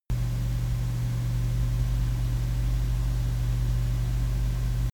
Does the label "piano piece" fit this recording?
No